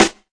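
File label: classic hip hop Snare; Classic Hip Hop Mega Kit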